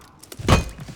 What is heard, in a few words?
light block; for honor